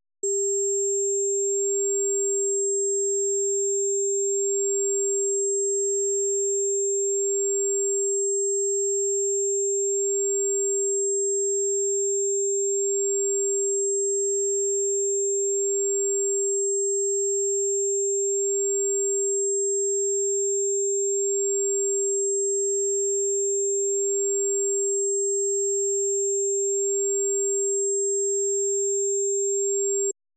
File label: 7.5 kHz - 440 Hz